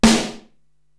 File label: SD Big Rock, Korg TR-Rack drum machine